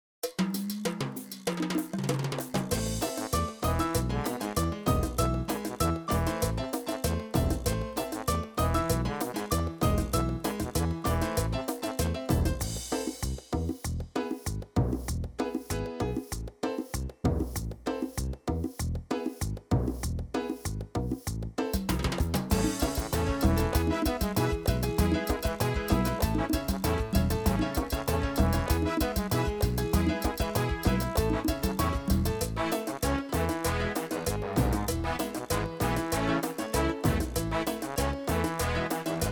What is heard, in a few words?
Cumbia Colombiana